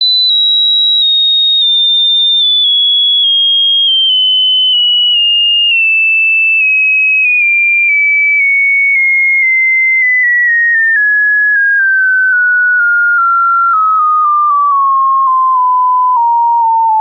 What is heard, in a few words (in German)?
cutoff selbstoszillation, moog multimode filter; universal audio